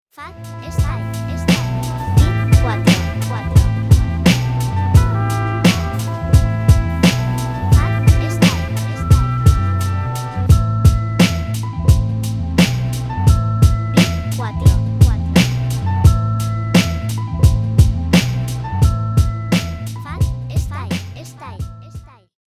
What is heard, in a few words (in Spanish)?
Muestra Beat